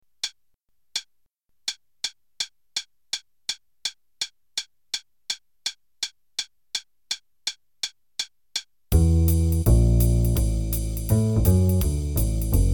Play a long (intro, head, solo, bass solo, fours, head, coda) qn=166, Jazz Improv Play-a-Long